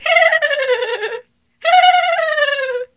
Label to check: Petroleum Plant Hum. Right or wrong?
Wrong